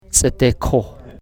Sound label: coughing